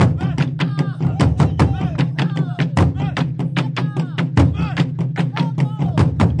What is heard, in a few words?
burundi beat, drum loops and breaks; 141 to 160 bpm